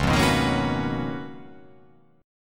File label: C sharp 13th C sharp